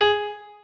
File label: piano